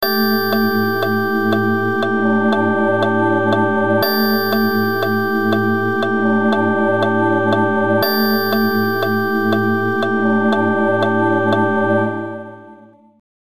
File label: mokugyo